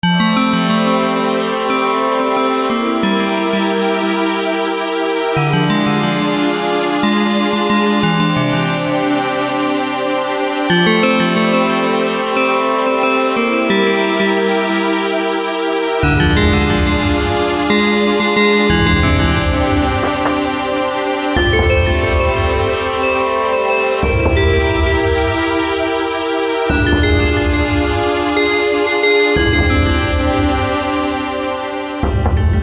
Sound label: bright bells; weeklybeats 2012